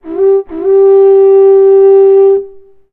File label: alarm attack player; 0ad; interface